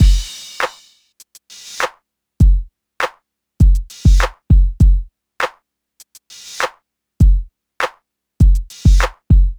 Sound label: Hip Hop Beat, Drums